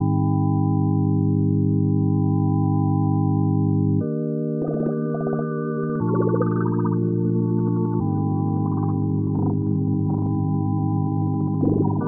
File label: Ambient Pad; Singularity